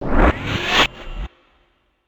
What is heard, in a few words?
status up